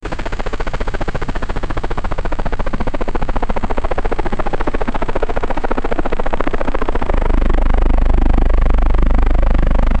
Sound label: helicopter